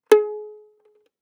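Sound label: Violin pizzicato fortissimo on D string A4 stereo; MIS Pitches - 2014; Strings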